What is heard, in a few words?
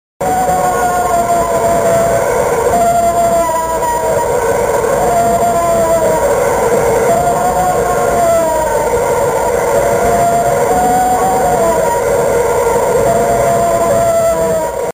moan; Pennsylvania, 1973; Diese Laute eines angeblichen Sasquatch wurden 1973 in Westmoreland County, Pennsylvania, aufgenommen, nachdem man auf ihn geschossen hatte.